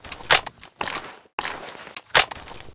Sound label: double shotgun reload; STALKER